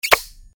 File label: formation